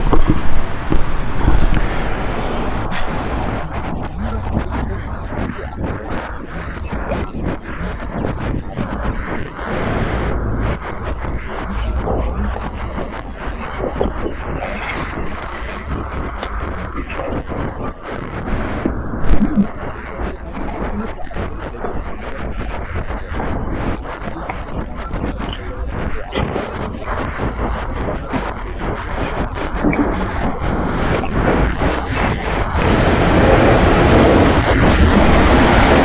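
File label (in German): Straßengeräusch mit Sprecher Voice Denoiser Inverse; Steinberg SpectraLayers Pro 10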